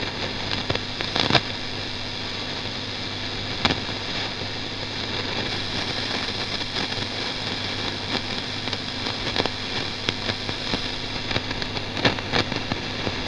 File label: vox crackle